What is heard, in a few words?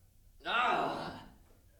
AAARRGGHHH!!!!!